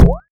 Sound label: jump; runner